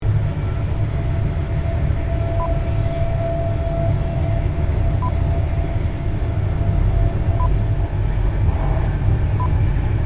Onboard Modern Train Corridor

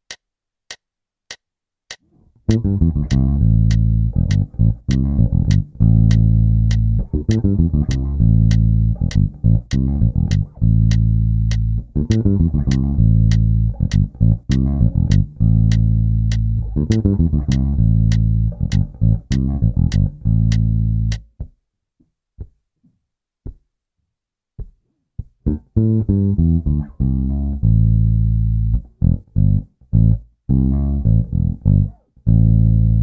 Straight blues bassline in A

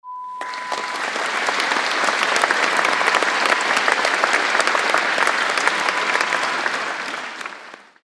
Applause Large Hall sound

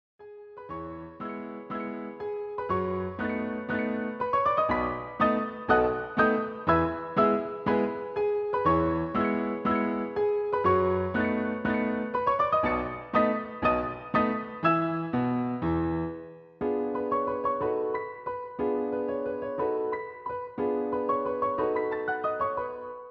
Hesitation Waltz; Henri Klickmann

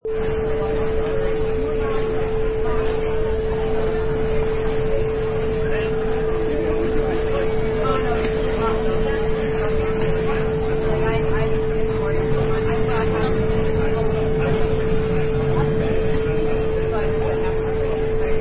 Train Station Background

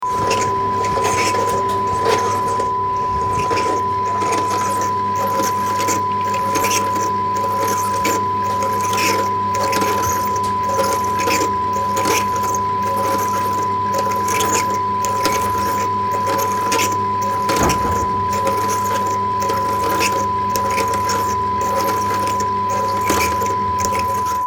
Noisy Air Con Pump

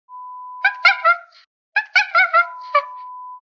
Monkey

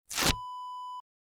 paper rip

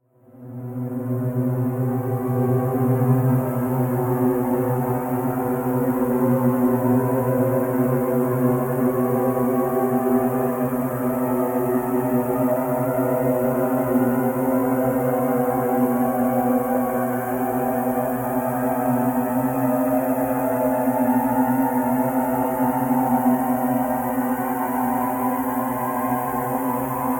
Sci-Fi SFX

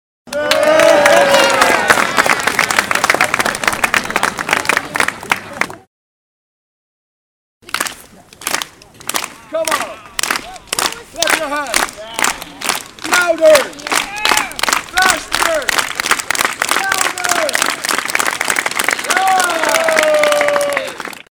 Crowd Applause

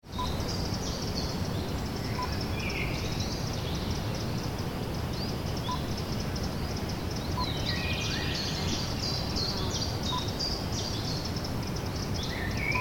Conifer Woodland Spring

